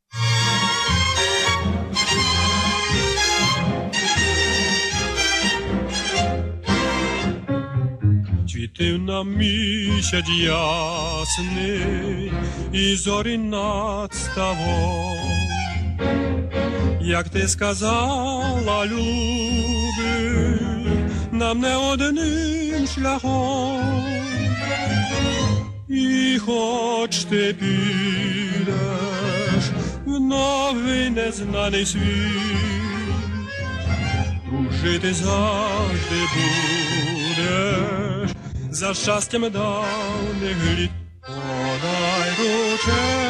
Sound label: Ukrainian tango